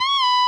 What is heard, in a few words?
Bend full Release